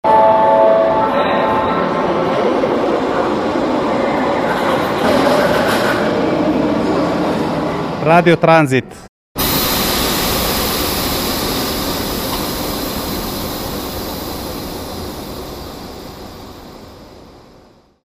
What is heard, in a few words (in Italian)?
jingle con treni